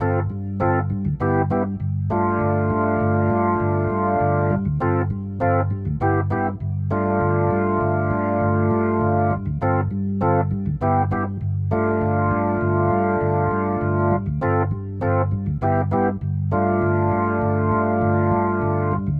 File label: Rotor Riff, Organ; Loops; Rotor Riffs; Sonic Foundry (Sony Creative Software); Joe Vitale Organ Donor